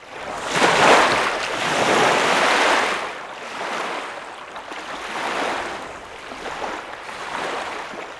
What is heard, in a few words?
big wav; Mafie 1